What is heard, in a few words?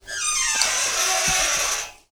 GATE Metal Open Creak